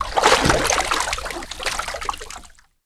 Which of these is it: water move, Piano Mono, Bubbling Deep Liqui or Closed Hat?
water move